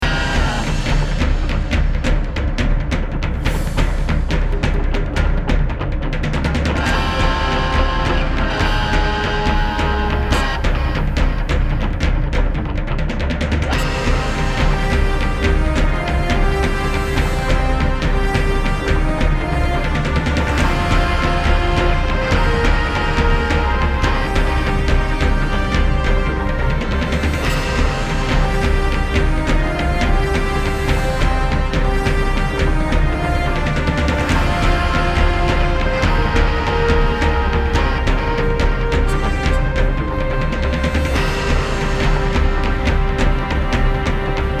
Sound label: Endbattle Tutti; westerado double barreled gamerip